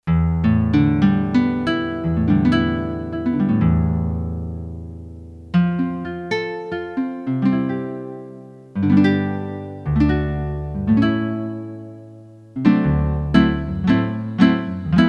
Guitar

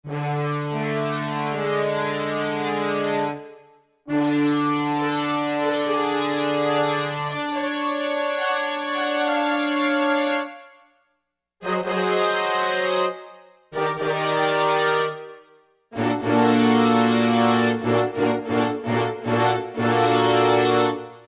Class Brass; Factory Preset examples

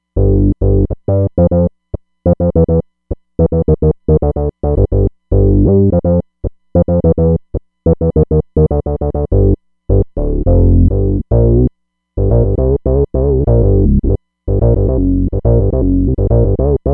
the ONE, Roland Fantom XR patch

